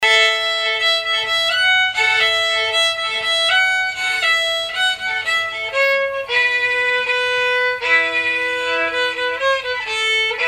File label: up jumped a slow, fiddle